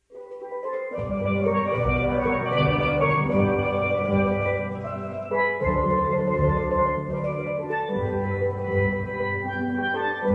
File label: minuet in g